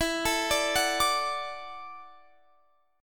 E 7th Suspended 2nd Suspended 4th, down, Guitar, Standard; E7sus2sus4 arpeggiated; capo=3